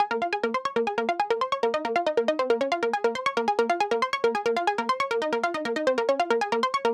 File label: Pluck DRY 138BPM, A#minor; Melody & Synth; Vengeance Samples; Fusion Kit